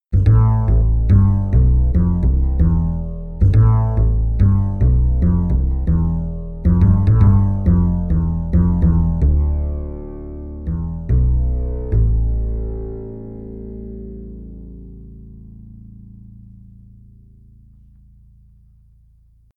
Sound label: bass